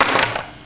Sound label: Chips on Rack, poker; iPoker 2000